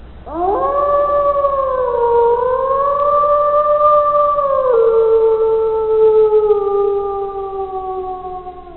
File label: Howl, animals